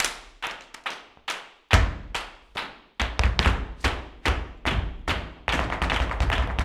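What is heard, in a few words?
percussion, FARRUCA TAP, Flamenco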